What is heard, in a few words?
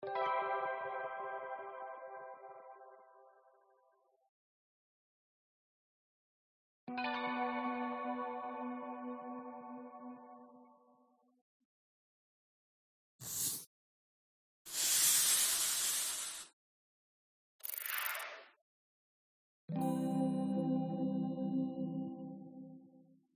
sfx; celebrating marie tharp